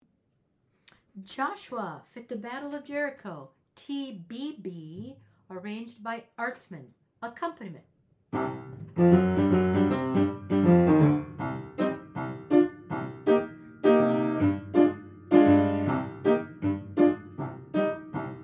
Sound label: ACCOMPANIMENT; JOSHUA FIT DA BATTLE OF JERICHO; TBB